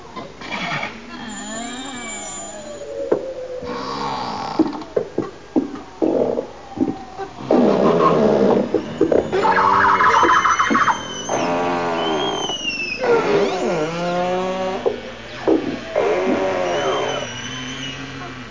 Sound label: beluga; walvisstrandingen